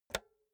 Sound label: insert xlr multi socket hard; objekte; elektronik